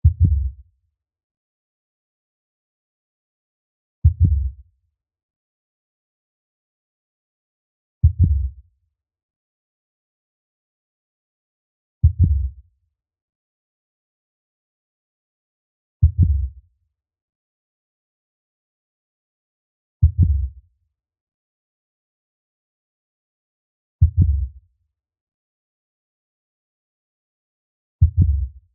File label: animals blue whale heartbeat loop